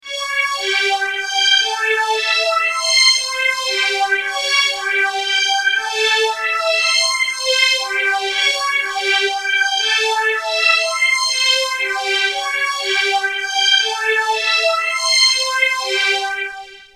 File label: strings A intelliphase; x5dr